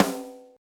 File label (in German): snare drum fett klingen lassen transienten